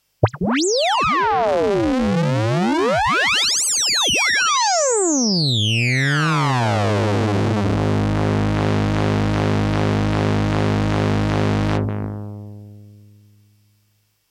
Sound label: Casio CZ101 ringmod; Casio CZ-101 is a digital synthesizer using phase distortion synthesis